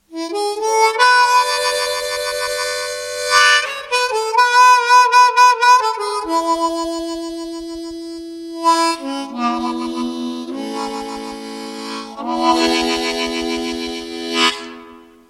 blues short solo, Session Steel Blues Harp - 10 Kanal Mundharmonikas in Richter Stimmung (Blues); Standard Mundharmonikas